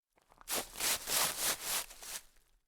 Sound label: rustling leaves